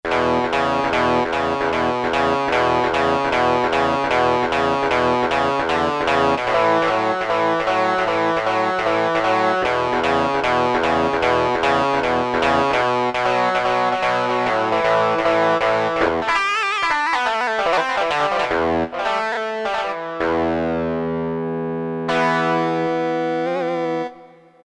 GUITAR

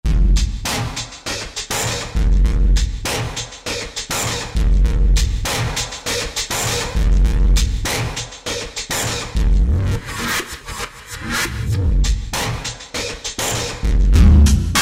Roland V-Synth; Traship-Hop; Factory Patches

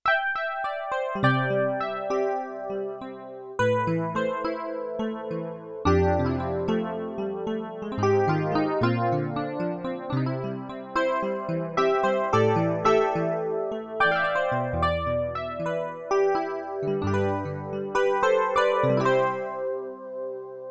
E Piano 1, Yamaha TX16W; Combo Performance 1

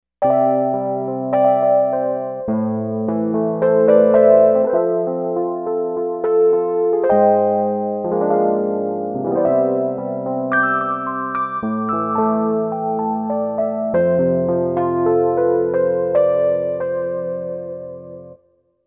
Old Tines

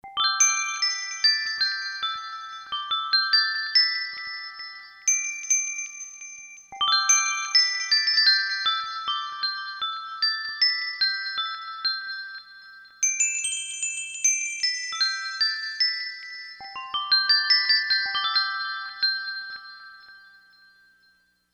Tiny Bells, Korg MSC-4S Orchestra; Orchestra 1

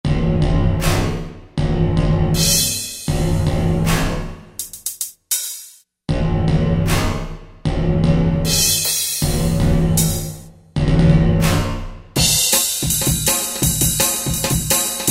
Korg TR-Rack Jungle Kit

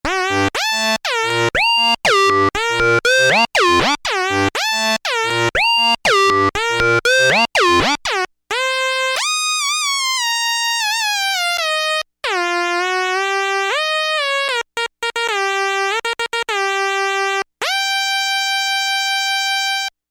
Bitter Lead

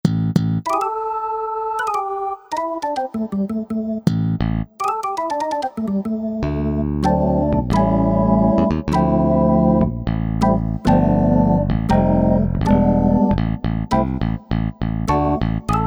El Bs Organ

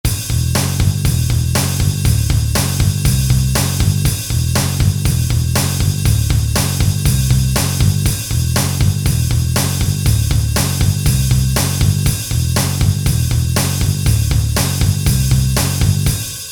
Hard Rock

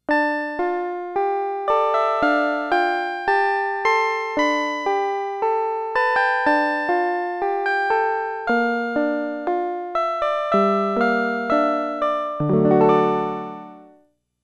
Celeste

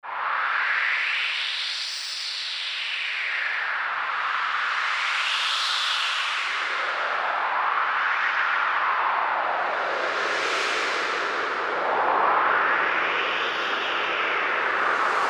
Wind Plus; Generalmusic Equinox

